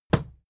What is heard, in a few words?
fight impact sweetener cloth